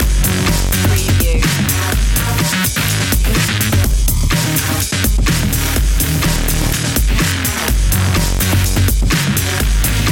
phunky beat loop

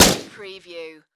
Machine Gun Sig SG5

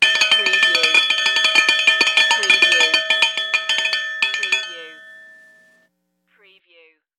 Bell Triangle Constant Short

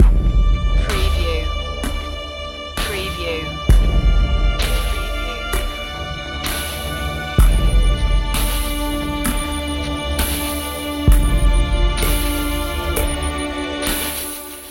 dark afterlife loop; Royalty free music that is gloomy, sombre and pensive in sound.

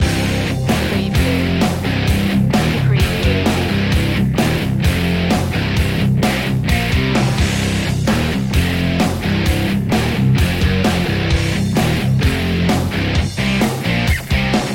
attack of the guitars loop

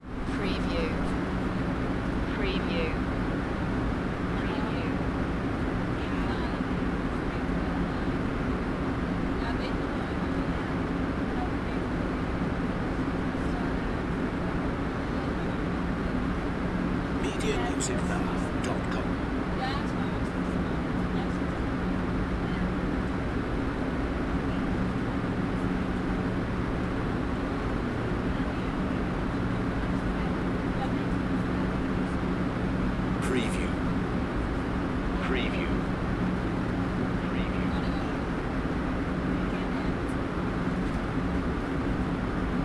Aircraft Interior

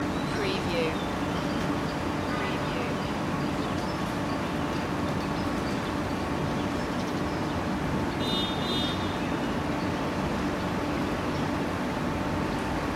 city side street ambience loop

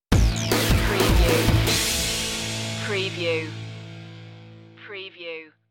crash course stinger

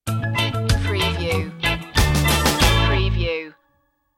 rock steady stinger

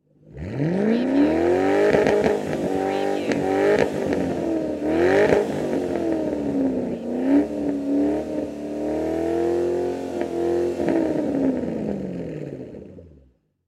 Car Ford Gt500 Kr Mustang Engine Rev

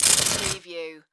Electrical Arc Zap

